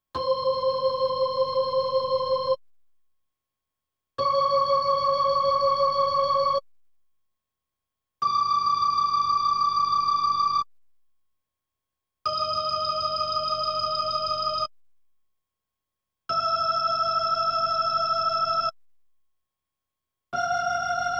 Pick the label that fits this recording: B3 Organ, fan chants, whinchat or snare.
B3 Organ